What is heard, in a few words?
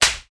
BEATTACK